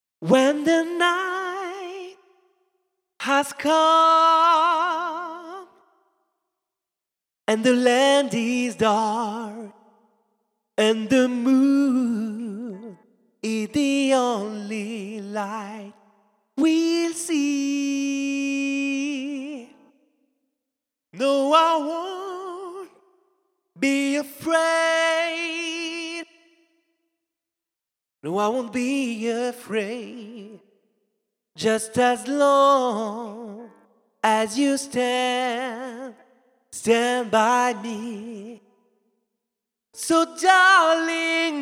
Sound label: acappella, gospel